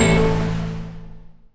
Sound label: Wild Water Scatter